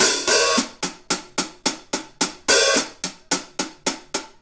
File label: Hi Hat Pattern